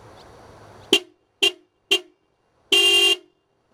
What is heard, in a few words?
horn